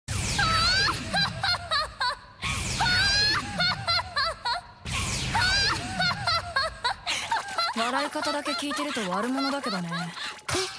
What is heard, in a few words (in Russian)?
smeh; smeh cundere